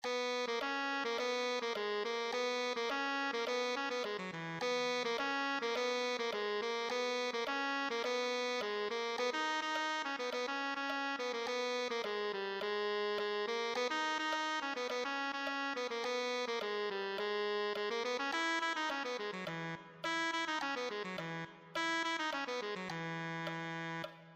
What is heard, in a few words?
tenor saxophone